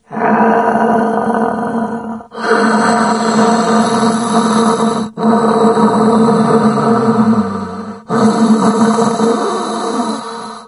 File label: rebreather, hazmat zombie; doom3